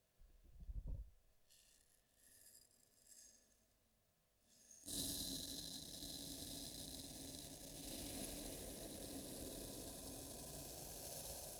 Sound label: Rice